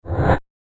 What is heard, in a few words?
Broken Machine Whoosh; Whoosh Sound Effects